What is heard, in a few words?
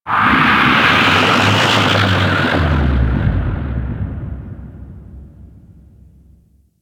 Ocean Kraken Bellow; Ambience; Warframe; Grineer Ocean